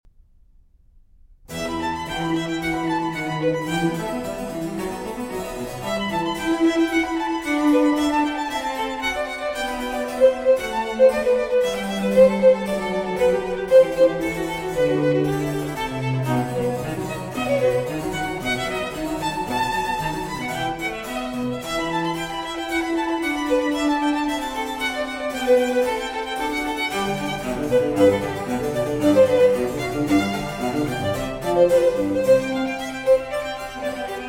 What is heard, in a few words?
Sonata in E minor for Violin and Continuo